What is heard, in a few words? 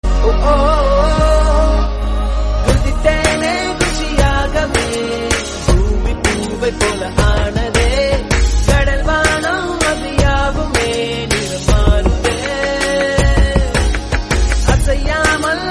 Song Tamil